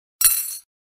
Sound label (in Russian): lojka v chashku